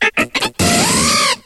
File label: Cry; Pokemon Essentials; Pokemon Iberia V1.03